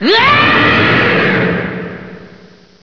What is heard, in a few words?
powerup; shmod